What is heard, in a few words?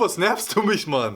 hurt; spackenmobs; apored